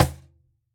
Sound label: Cajon hit fff; Idiophones; Struck Idiophones